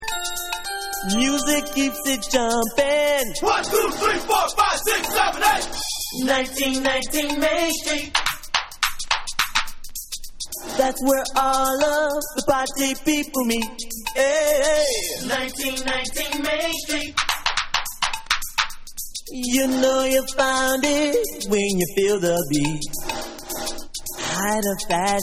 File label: Accappella